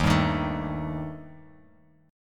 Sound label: D sharp Major Flat 5th Bass Standard; strummed; 11,10,13,14; JGuitar